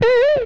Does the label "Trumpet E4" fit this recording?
No